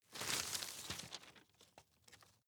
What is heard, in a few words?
rustle medium; foerster